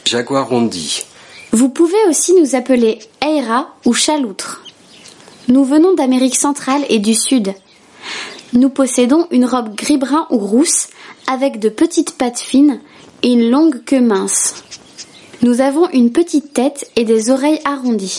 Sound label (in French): Jaguarondis